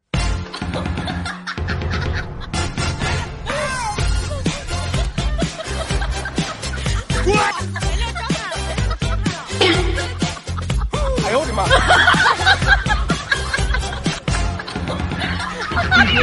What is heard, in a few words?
laugh; breadradio